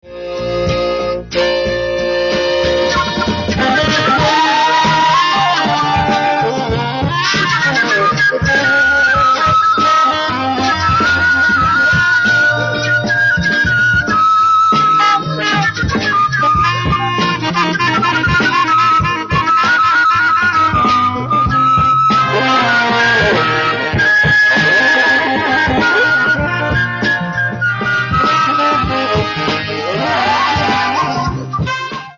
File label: flute